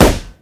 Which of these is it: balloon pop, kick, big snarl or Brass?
balloon pop